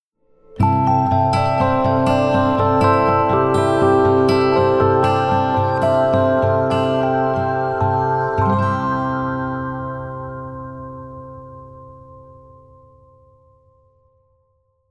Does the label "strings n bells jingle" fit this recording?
Yes